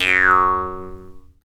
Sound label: JEWS HARP, Earth Tones; Jew's Harp & Spoons